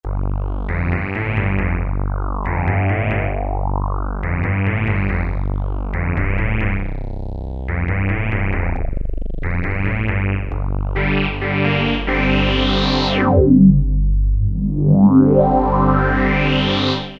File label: Tek Woofer, E-mu Morpheus; Factory Presets